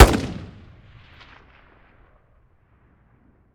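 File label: weapon ar fire player atmo exterior; gmod; viper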